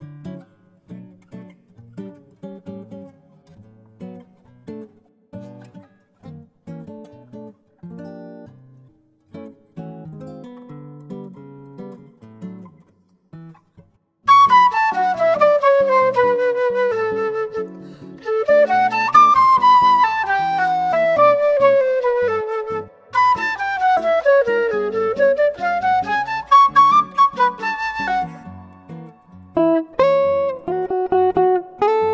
Klassicheskij dzhaz, Zvuki Restorany

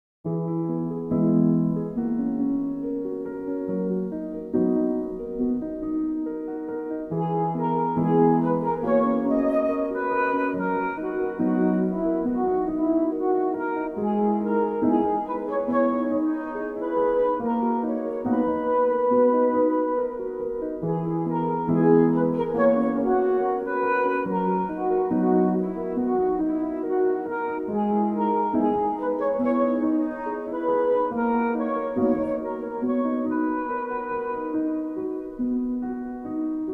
Piano

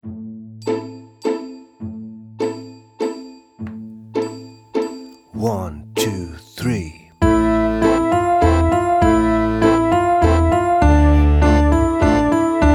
Piano Little Waltz

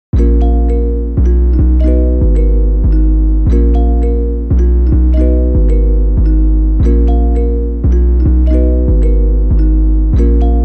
TYPE BEAT

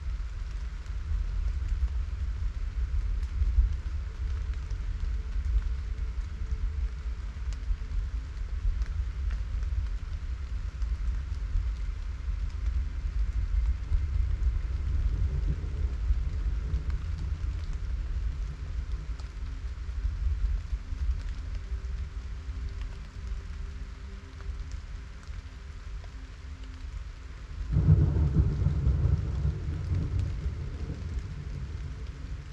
Windy Rain Song

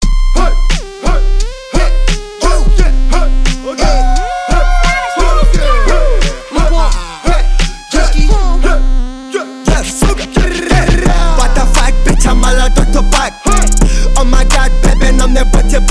31-33-35hz Low bass

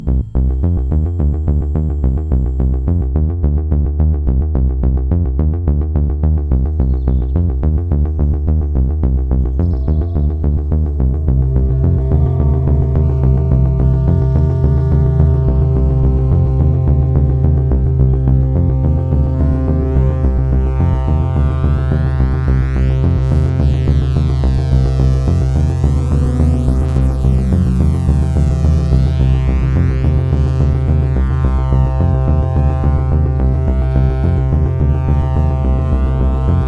Bass Chase

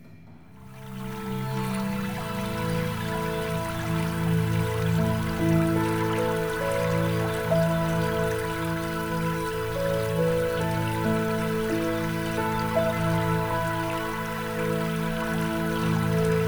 Pink Noise

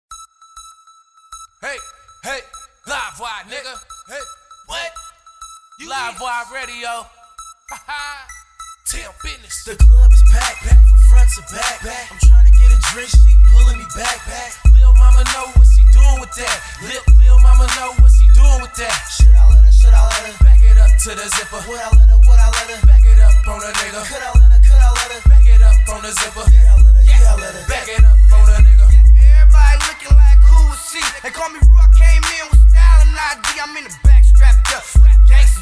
RAP, bassboosted

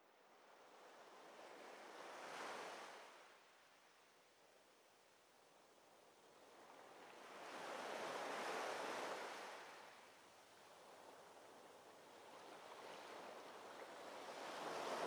Ocean Waves; Nature Therapy Music

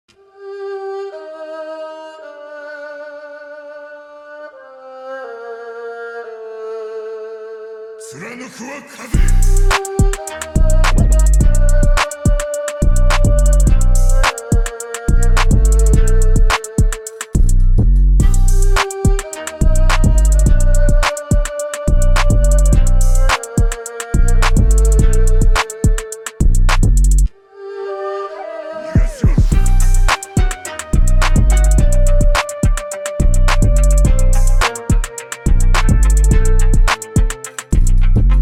Trap Remix

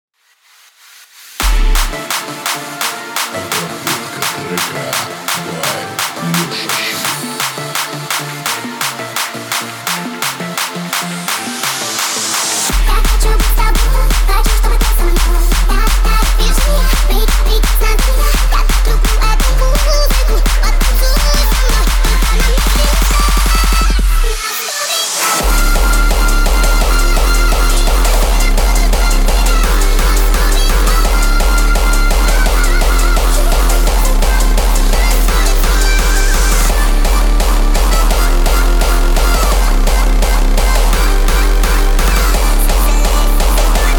37-41Hz Low Bass

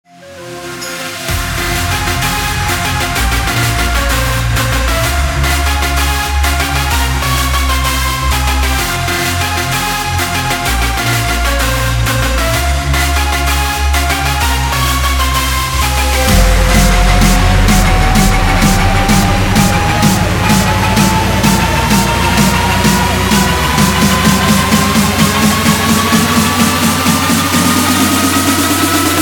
Flute Bootleg

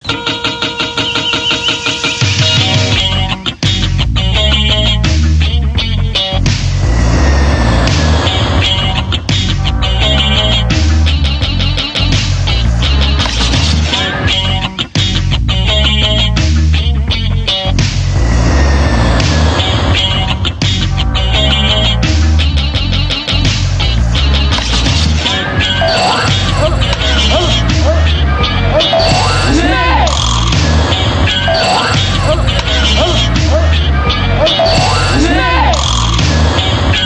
Solo Jazz

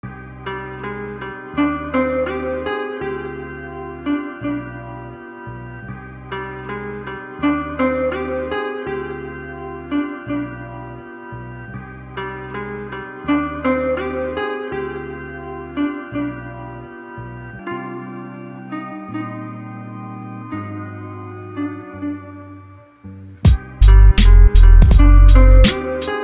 Beats

